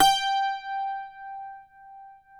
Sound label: CUATRO; Spectrasonics; Hans Zimmer Guitars VOL-2